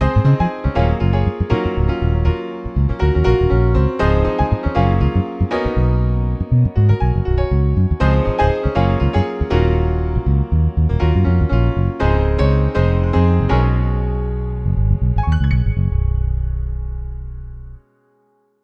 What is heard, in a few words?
Drive time, music beds, affects and jingles